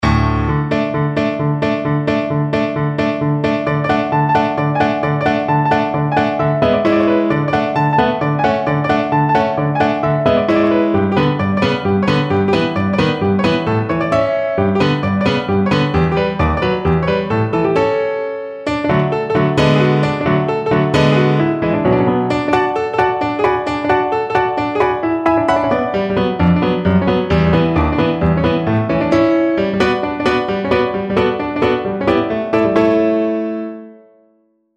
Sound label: romanian folkdance, piano; bartok